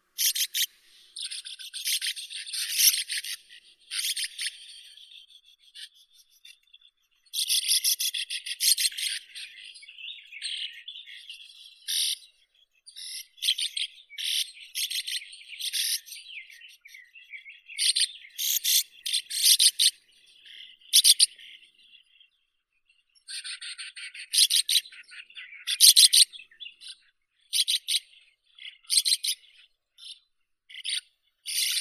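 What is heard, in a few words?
sand martin, Riparia riparia; lastochka beregovushka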